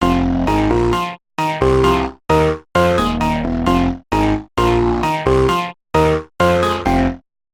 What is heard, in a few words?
HARP BASS; eShop